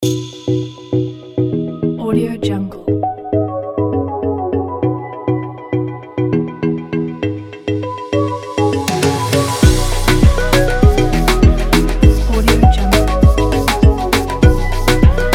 afrobeat

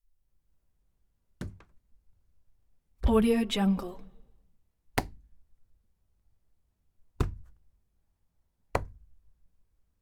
doorjamb grab hand or wall hold

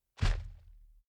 dinosaur footsteps boomy thumps

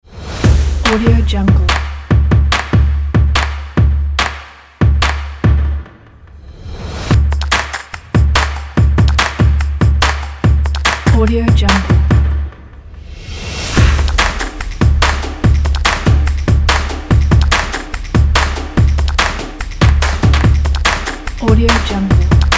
drum percussion

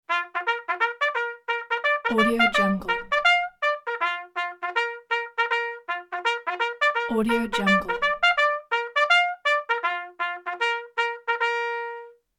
military bugle fall in call logo; sound ideas

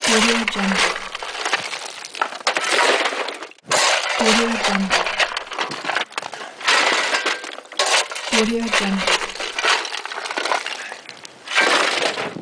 shovelling coal

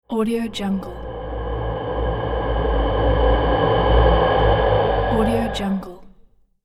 halloween atmospheric ghost

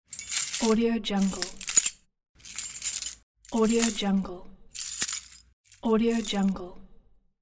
bunch of keys

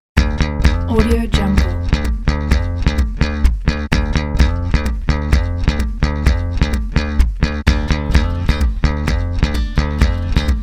acoustic breakbeat

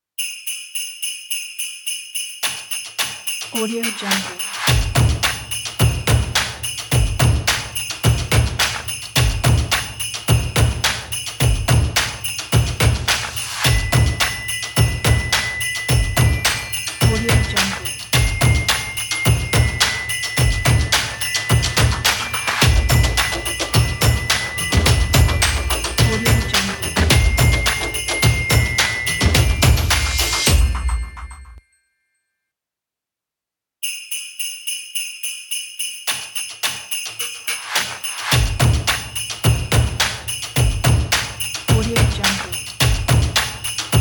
jingle bells stomp claps logo

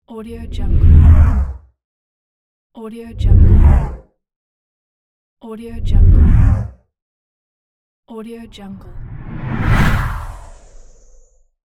whoosh transition

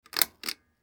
switch chain slow pull

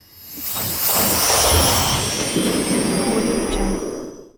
card game magic glitter missile

